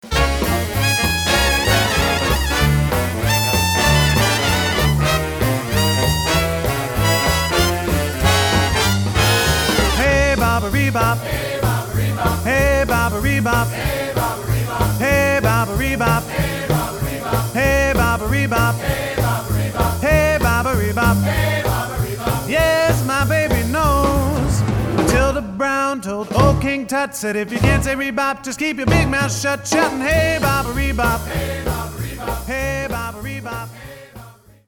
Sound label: swing band, big band